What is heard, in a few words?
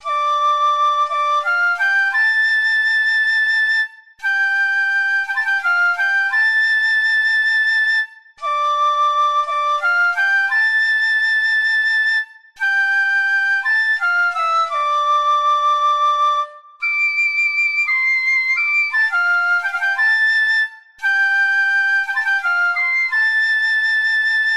shinobue japanese flute